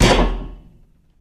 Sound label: wall bars; Golden Crayon Sounds